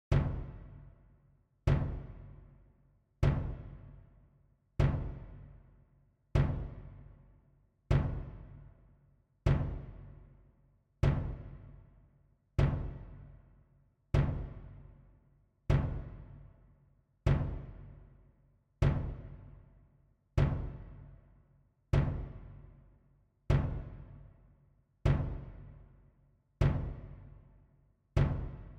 Grosse caisse; Percus

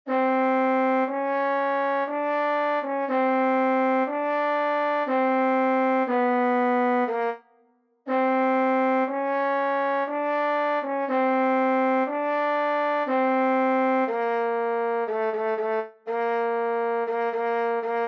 vive le vent trombone

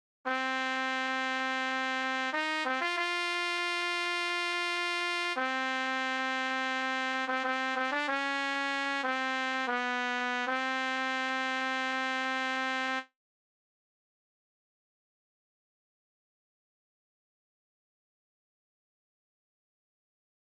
Trompette 2; OSS 117

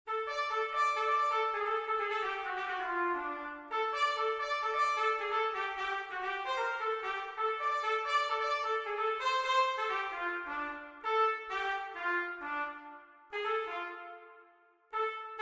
Trompette en Sib

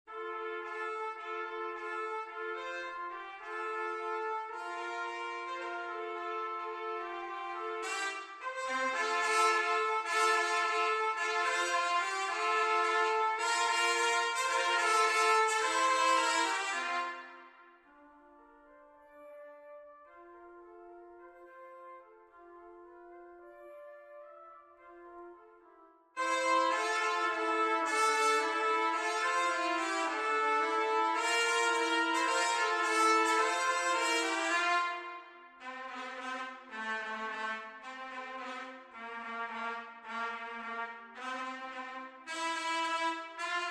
2 trompettes

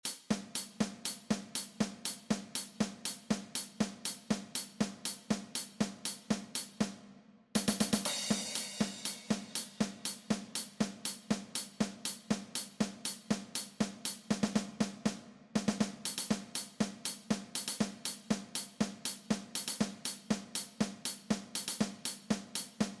Set de batterie; Percus

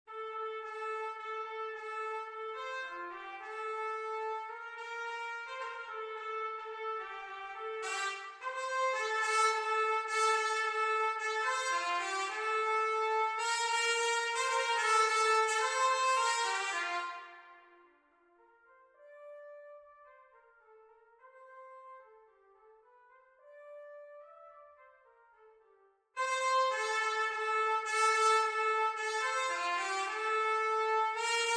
Trompette en Sib

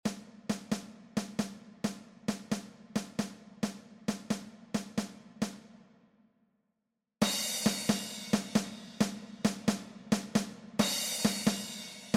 Set de batterie; Percu

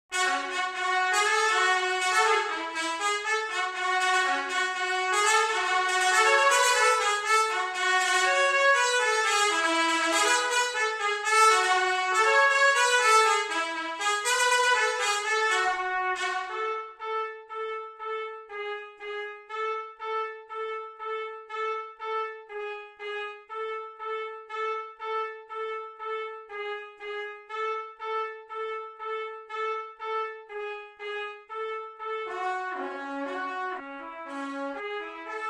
Trompette en Sib 1

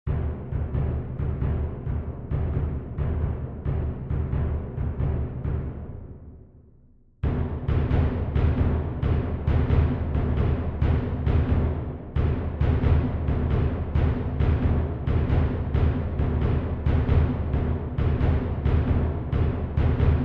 Grosse caisse, Percu

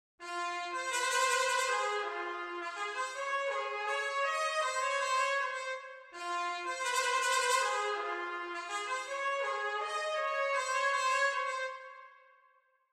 Trompette en Sib

